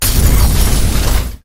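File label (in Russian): взрыв с большим количеством мусора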